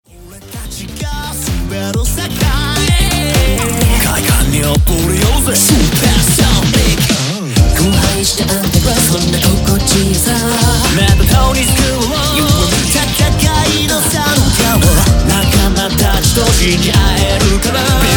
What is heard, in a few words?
Groovy Good Beat